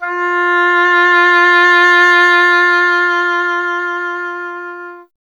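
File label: oboe, wind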